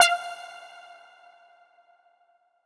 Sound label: lead pink F; leads